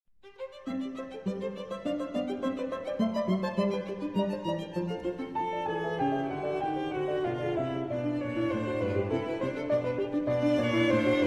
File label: russian piano trios; Korsakov 2; tria pro klavír, housle a violoncello